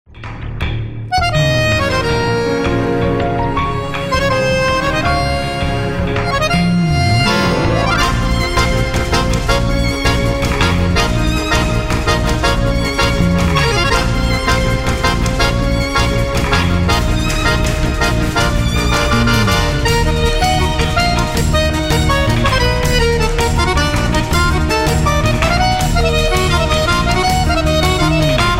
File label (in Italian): tango trasgressivo